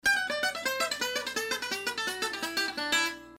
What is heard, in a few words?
Descending Triplets; Django